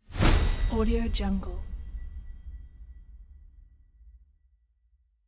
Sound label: freezing